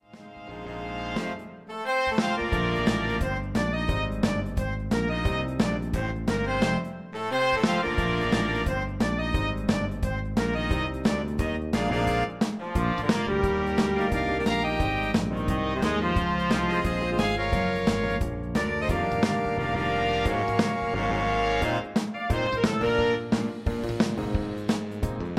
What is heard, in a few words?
Big Band